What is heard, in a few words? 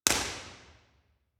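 impulse response, Curtains, W, B-Format; room survey; Messiah College; Parmer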